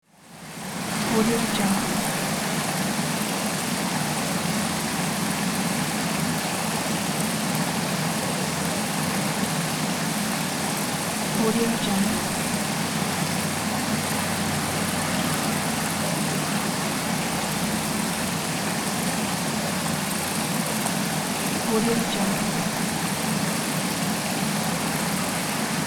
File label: water flow